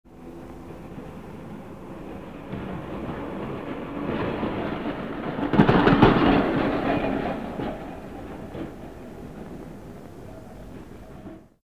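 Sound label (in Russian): трамвай приближается и отъезжает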